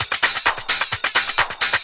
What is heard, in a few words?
drum 130 hihat, Techno Trance, Drumloops